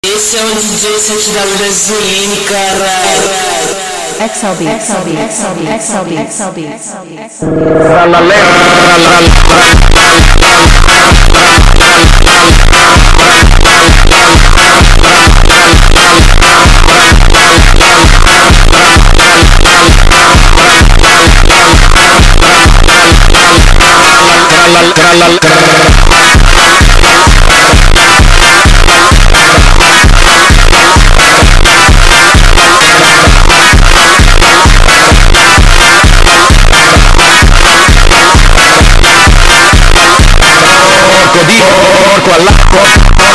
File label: FUNK